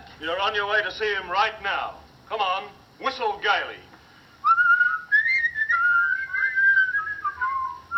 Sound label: whistle